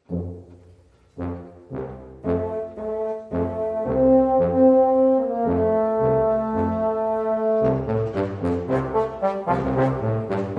Brassy Jingle Bells

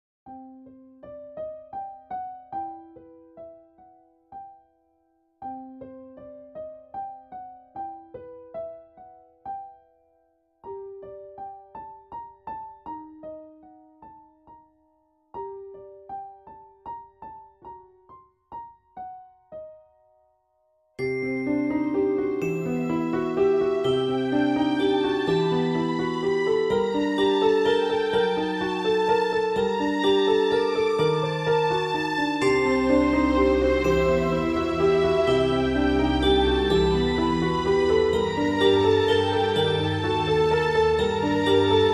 The Haunted Manor House, Ghostly Pianist

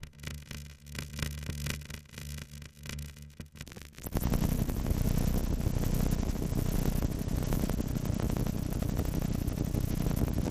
Static Pulsar Musical Pulsers

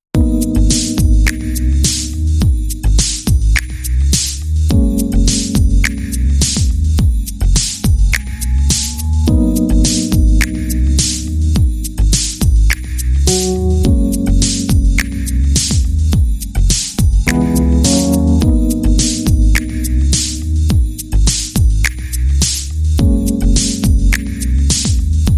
Cocktail Lounge

